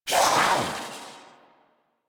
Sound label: Kavat Vocal Attack Medium; Warframe; Khora